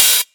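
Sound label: Open hi-hat; Trance; Zero-G Trance Inducer; 90 s Sample CDs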